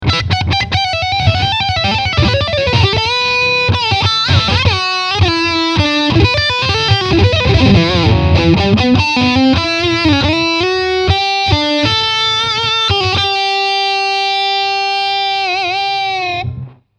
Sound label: Initimidator Lead full Gain